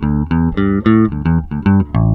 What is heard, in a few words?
FREE L 111 A, Bass Grooves; USB Soundscan vol.30; AKAI